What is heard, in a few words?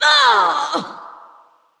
Wound Medium, Creature; Fjola